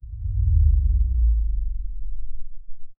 sci-fi explosion; energy wave

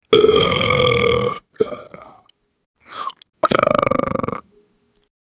big satisfying slurp, eating

